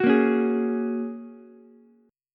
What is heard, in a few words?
A sharp Minor 7th Sharp 5th, Ukulele; strummed; 11,10,9,11; JGuitar